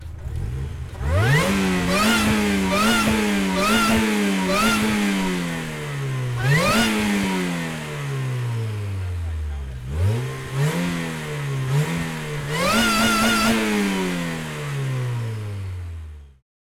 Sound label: Car Show